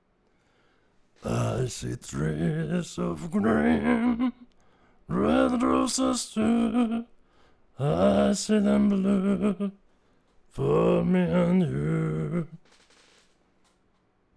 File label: Growl male